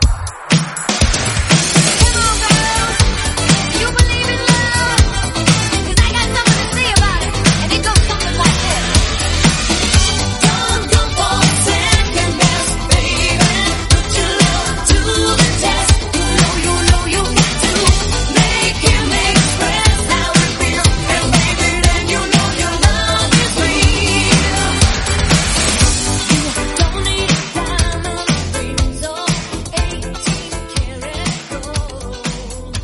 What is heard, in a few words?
Snippet 121bpm Em; Redrum; 1989